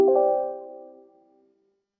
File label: juno 5